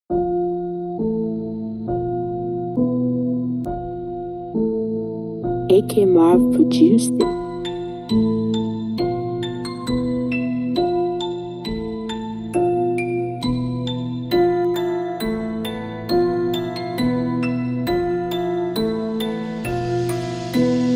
UK Drill Instrumental Type Beat

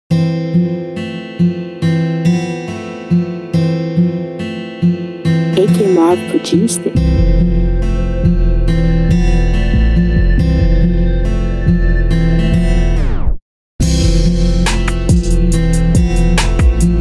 Trap Instrumental, Type Beat

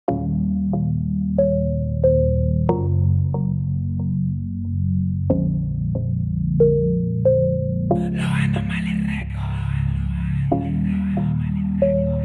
INSTRUMENTAL REMAKE

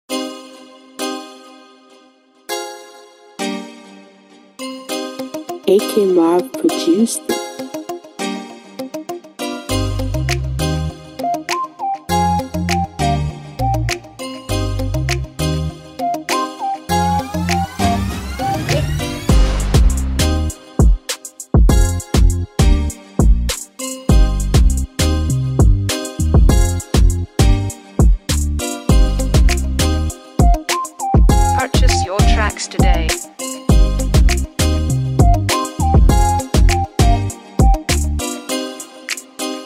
UK Rap Beat Type Beat

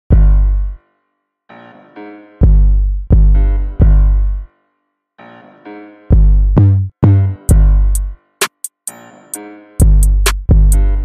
Long instrumental

